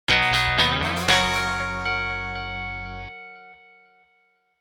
Message, Nokia; 6710 Navigator

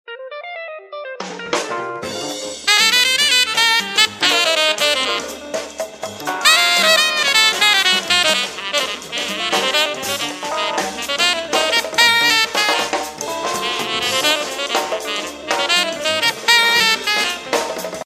Jazz Bar; Samsung GT-S5233 Star

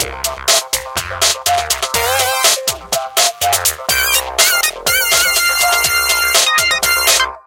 music urban rhythm; Samsung GT-i7680; cmcc

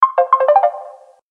Message incoming; Samsung SGH-T939 Behold II

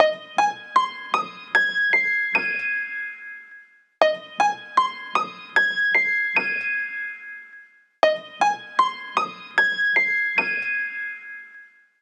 ringtone slowstep; The Light Phone II

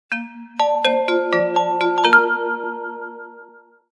sms; WAO

